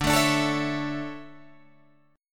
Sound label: D Major 7th Suspended 2nd Guitar Drop D, chord